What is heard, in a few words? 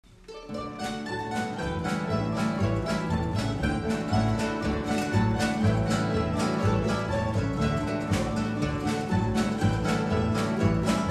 polka medley